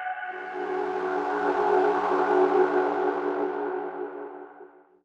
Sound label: echo layer; weapons; dota; earthshaker